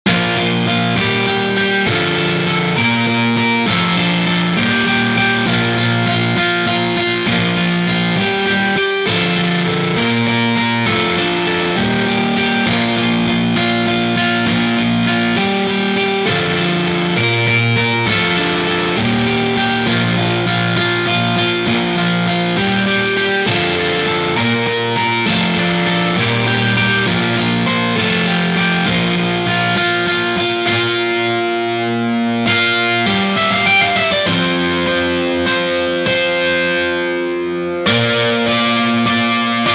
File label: RealGuitar GuitarRig; Colonnese